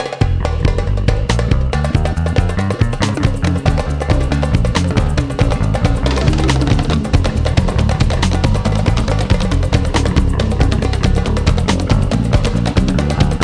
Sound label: Run Instrumental; Walt Disney's The Jungle Book: Rhythm n' Groove; PS1, PS2, Windows